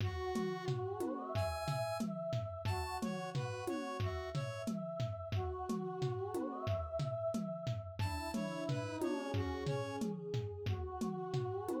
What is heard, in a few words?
experiments in glissando